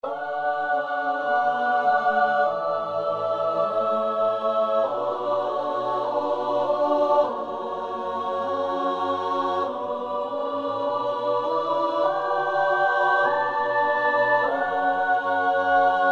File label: Signal